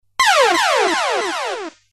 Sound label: Death Sound Effect; Megaman X